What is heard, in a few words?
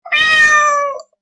cat